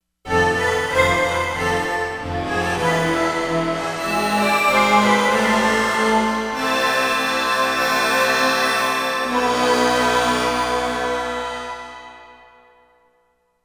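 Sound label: ethno strings, Korg; Korg MSC-13S Ethnic 2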